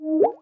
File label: Bawhoop; jibo